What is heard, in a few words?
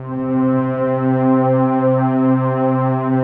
rich mellow, Rich Pad; Optical Media International; Sonic Images Library